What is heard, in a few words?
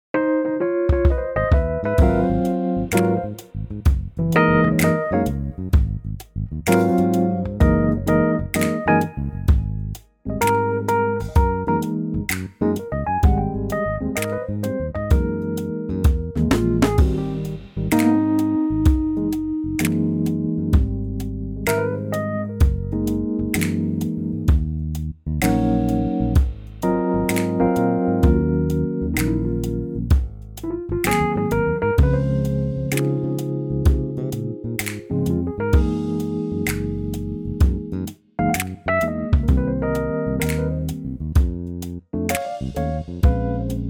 Ab smooth